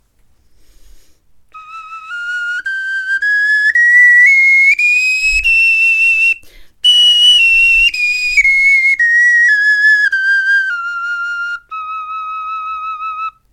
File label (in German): tonleiter, knochenfloeten